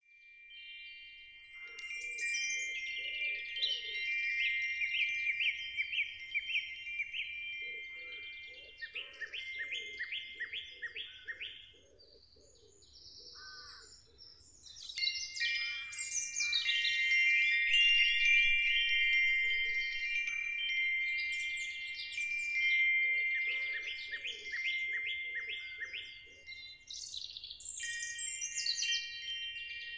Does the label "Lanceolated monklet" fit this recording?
No